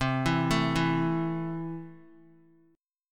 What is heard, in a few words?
C 6th C down Guitar; C6 arpeggiated